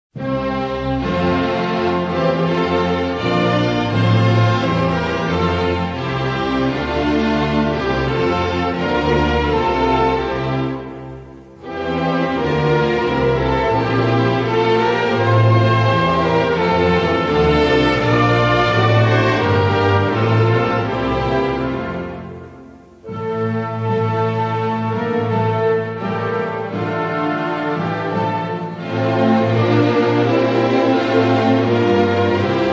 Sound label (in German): Symphonieorchester, Landeshymne; Burgenland